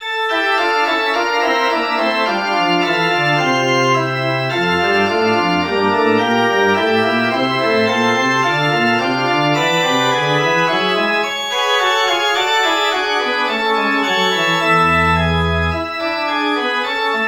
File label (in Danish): forspil